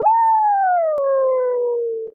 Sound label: Awoo; Princess & Conquest v0.20.06